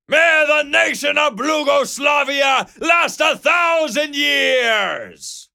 charge

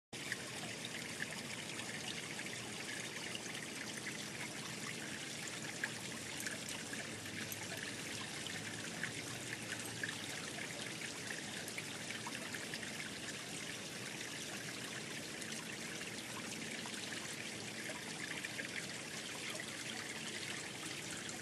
flowing water open

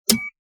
beep landmine

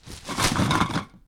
trash bag

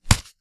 boxing punch

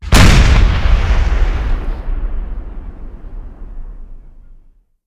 explosion high; Explosives

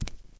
wood barefoot, footsteps

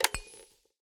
flashlight

